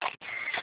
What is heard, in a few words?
camera shutter